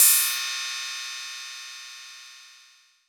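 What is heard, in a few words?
cymbal, ride; PhreakyBoi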